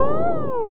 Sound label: monster fish